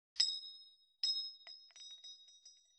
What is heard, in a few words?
40mm shell drop; Titanfall 2